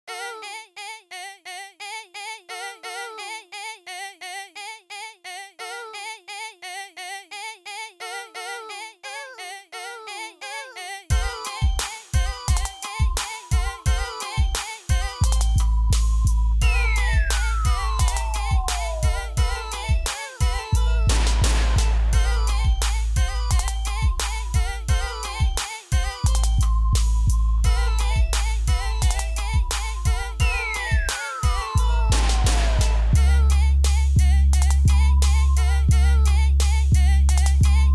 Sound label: Instrumental